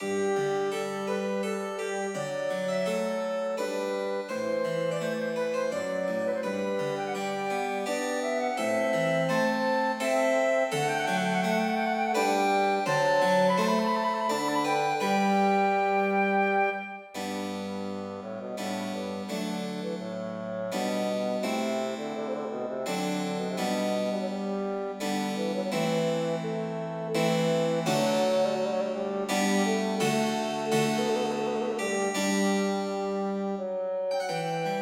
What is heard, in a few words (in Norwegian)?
Aria Menuet for fløyte, fagott og piano; Aamodt